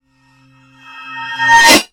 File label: Swell Metallic; bottlerocket